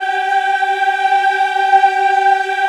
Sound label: G4, Choir; USB Soundscan vol.28; Acoustic & Synth; AKAI